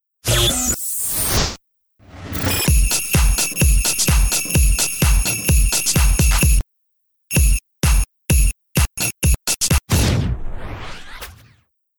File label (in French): Base rythmé